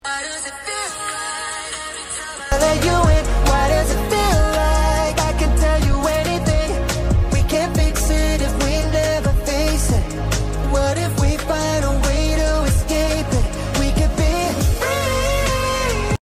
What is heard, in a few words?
smartphone ringtone phone iphone; Kpop demon hunters